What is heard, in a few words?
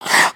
ice skate